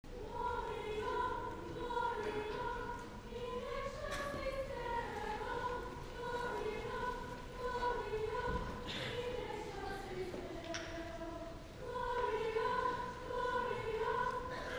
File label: Medieval Gloria; Yule Cheer